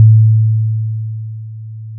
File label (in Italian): Corda freq